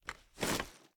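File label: sniper reload fast movement; gmod; iw8; alpha50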